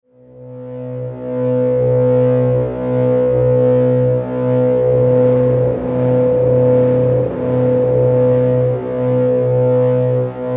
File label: Sweeper Electrical Pulse Hum; Production Element Imaging Element Accent Transition